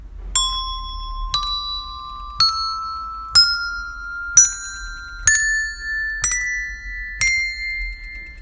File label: Premium 8 Handbells